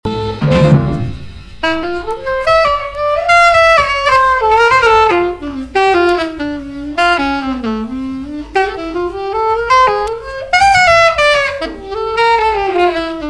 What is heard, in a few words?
Tunisia Break